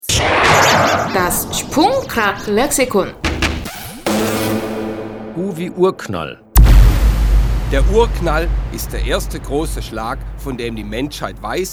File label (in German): Urknall